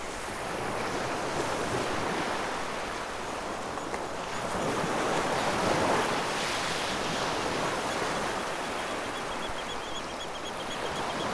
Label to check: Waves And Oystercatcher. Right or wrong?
Right